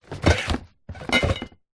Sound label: foley bottles wine in cardboard box movement